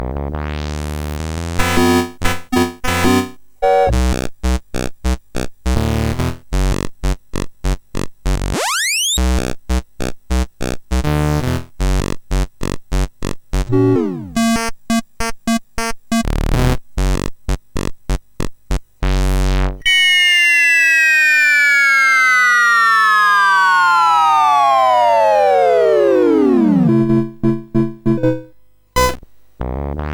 BASIC sid MOS6581R3; Spike

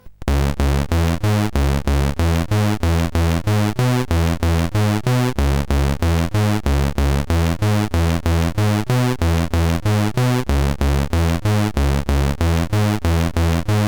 CSG8580; Square Scape 2

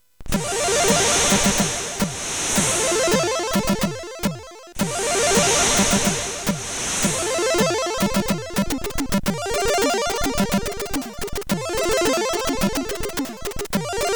Shuffle, CSG8580R5

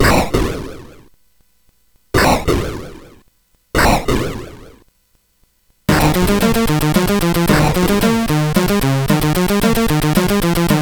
Thunder, CSG8580R5; Kwa-Boom Thunder; hvsc

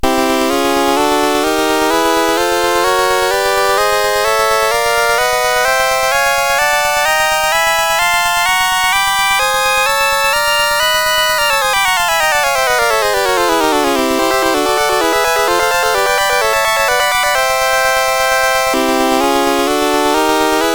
Major, CSG8580R5

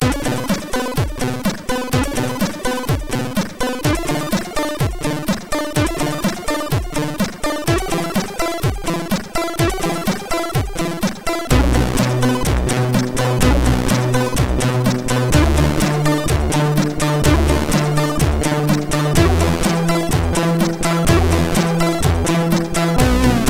Competition tune 4 sid MOS6581R4

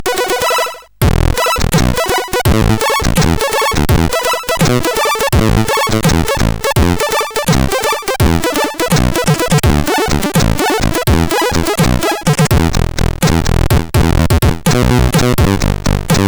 Arcade, sid CSG8580R5; Commando

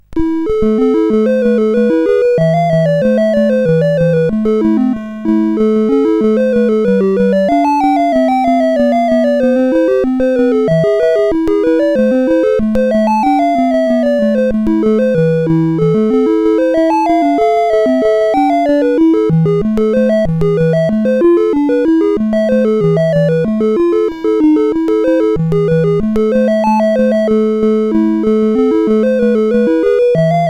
sid CSG8580R5, GAMES; Firequest

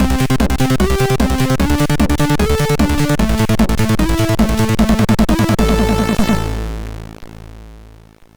Trance, MOS6581R4; Trance Sector Ultimate

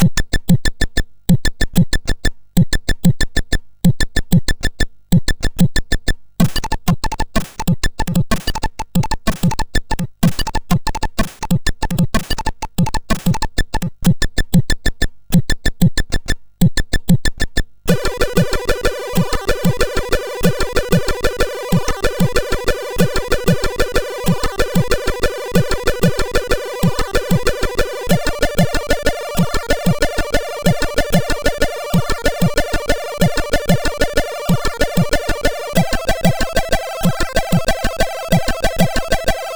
Bongo Rythm, sid MOS6581R4

